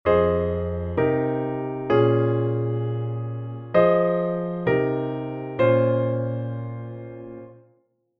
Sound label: verse sustained